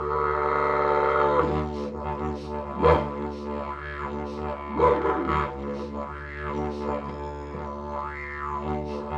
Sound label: Digiridoo